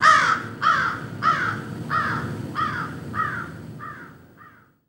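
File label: Crow Caws X7 Flying Away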